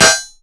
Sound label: wrech hit sound